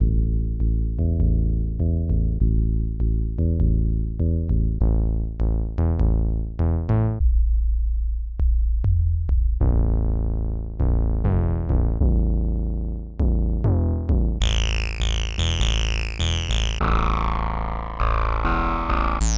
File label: synth bass